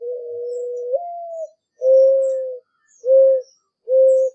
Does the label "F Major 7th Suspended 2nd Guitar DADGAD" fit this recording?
No